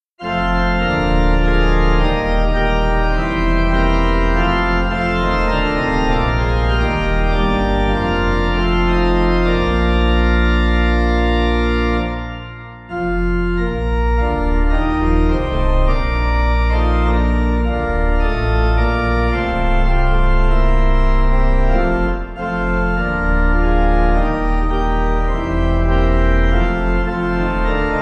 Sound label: Ride On St Drostane Pipe